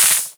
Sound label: Open Hihats, Cymbals; Vengeance Samples